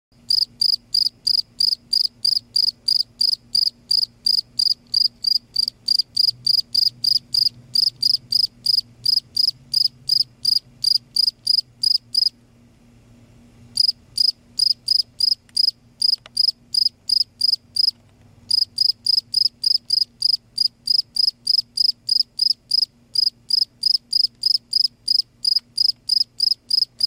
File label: cricket insect sound silence